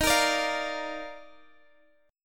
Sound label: Eb Major 7th Suspended 2nd, Guitar Open F; x,22,22,21,20,x; strummed